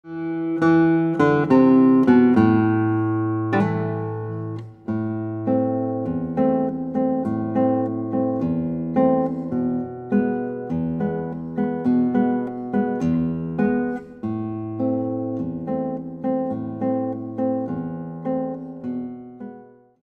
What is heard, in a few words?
Guitar